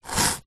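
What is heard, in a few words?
quill pen and inkwell sounds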